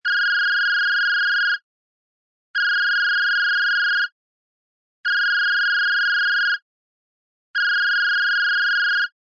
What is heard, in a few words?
phone ringing